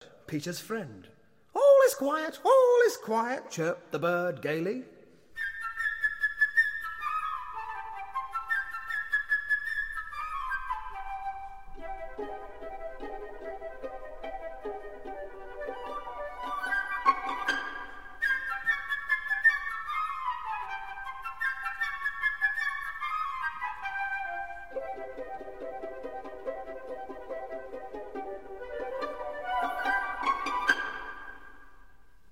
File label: flute excerpt, orchestra; Prokofiev; Abbado; Chamber orch Europe